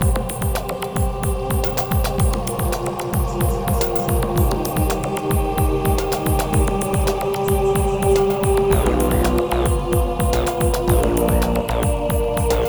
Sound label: Ambient Music Loop